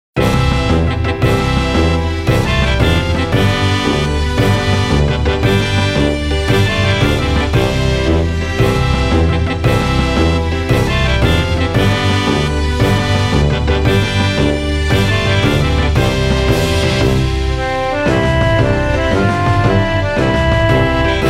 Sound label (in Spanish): himno school instrumental; saint dominic; conocoto ecuador